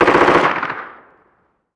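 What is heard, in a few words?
gun mp5 distant; Call of Duty Modern Warfare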